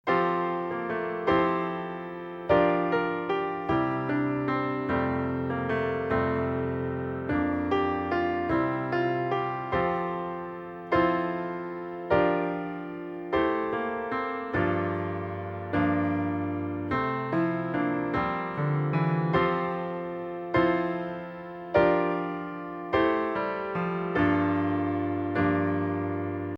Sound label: accomp; skye boat song